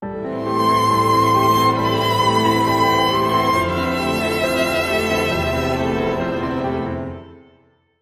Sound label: Beatless Sting